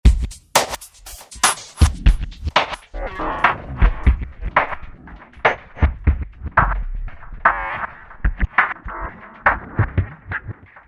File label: Hip Groove, Roland V-Synth; Factory Patches